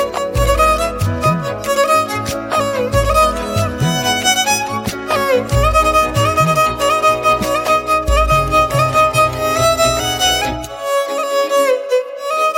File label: Violin, ringtone